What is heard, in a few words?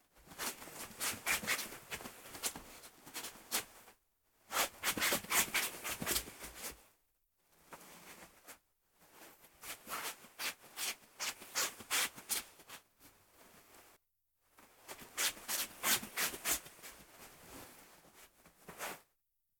step; 着物で歩いたり走ったり